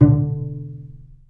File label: Bass Piz G D2; Digital Sound Factory Virtuoso